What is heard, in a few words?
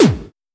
snare drum sample f key, Royality free acoustic snare sound tuned to the F note